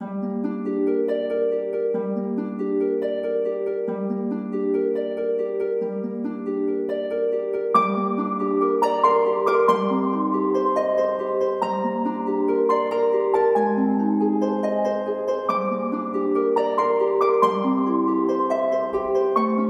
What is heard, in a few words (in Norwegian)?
caves uten kor